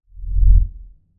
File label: Whoosh Transition; Whooshes